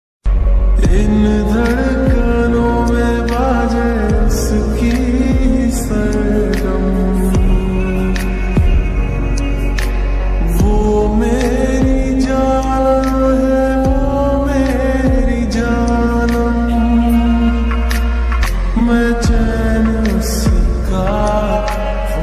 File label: romantic ringtone lofi ringtone slow motion ringtone hindi ringtone